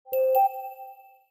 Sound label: Cuckoo, notifications; Vivo X27 Pro